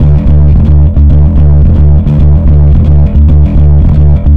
Distorted D, Bass; Bass X

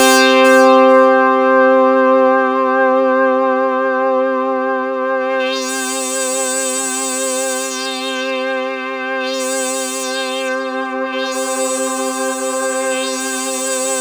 C3, Roland JV 2080; MG INTERVAL

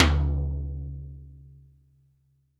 TD-20 Tom A, Roland TD-20 Toms

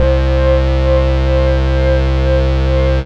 Bass Note C

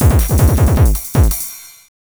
TH 909 160, Hard & Loud Techno

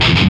GTR; Roland - LA Composer vol. 2

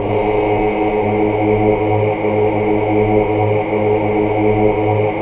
AAH

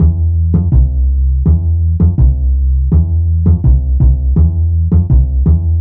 Upright bass loop 165bpm A2, Drum&Bass; Total Drum&Bass

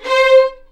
C5 VLNS ARCO DB; East West; The Ultimate String Collection

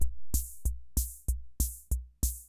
Ace Tone Rhythm Ace Loop, Drum Machines; 1967

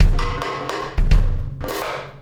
Wormhole Beat, Processed Drumkits

